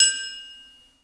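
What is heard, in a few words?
triangle half open; Percussion; Sonatina Symphonic Orchestra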